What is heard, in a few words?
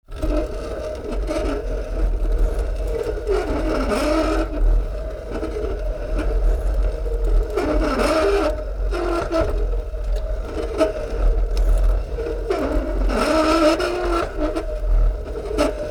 Rusty bicycle slow steady grinding gears